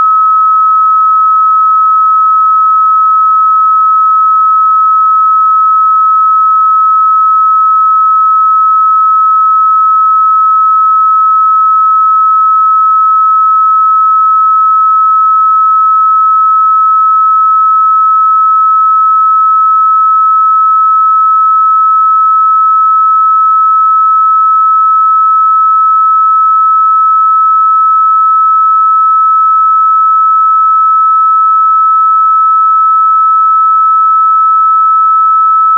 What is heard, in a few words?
1286 SINE LOG; SINE WAVEFORMS